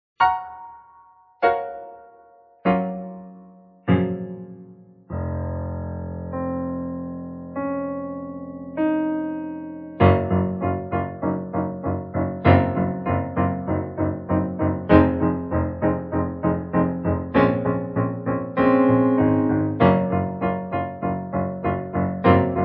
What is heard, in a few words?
Pianoverb Low Dark